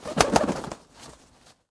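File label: Giant Red Condor damage; Gamigo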